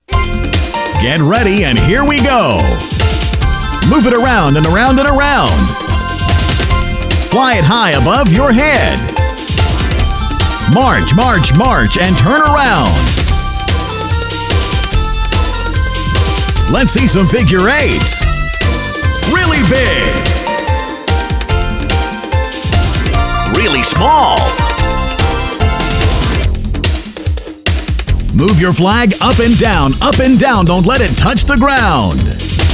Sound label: Rhythm Wand